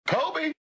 yell; kobe

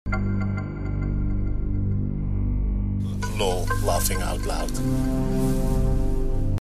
lol laffing out laut; peter r de vries

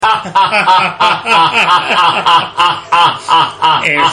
hahahahahahahaha

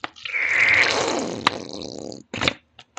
ketchup fart

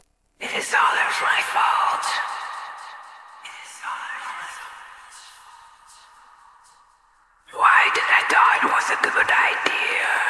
horror mode party club

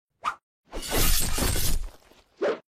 supercut transition

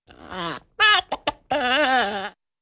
chicken clucks lovingly

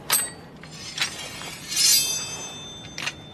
unsheathe

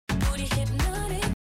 slomo hypnotic

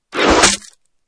arrow hit

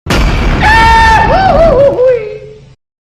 slap; slap battles; god hand

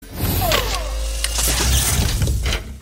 suit up noise

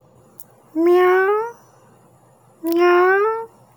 miau

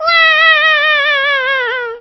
fall

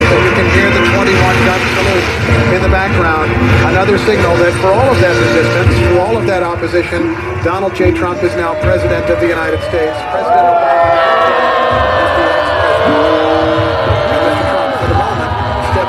protester screaming; at trump inauguration

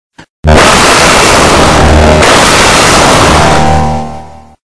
spawn bass boosted; panzer soldat

